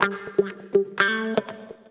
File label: 125 c, guitar licks; house; Vocal House; PS-28 Vocal House Samples; instrumentloops